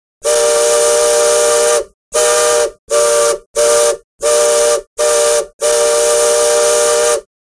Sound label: River Boat Horn